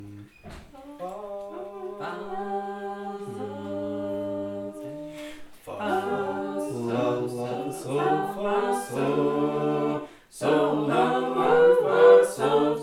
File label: Texas small group, sacred harp